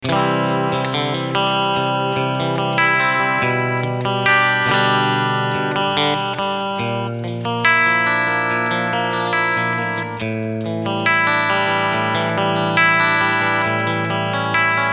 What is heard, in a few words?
Cleansound Bridge Middle